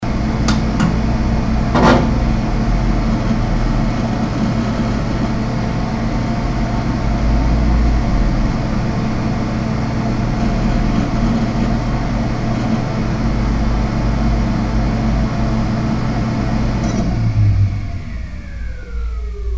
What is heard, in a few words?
shutdown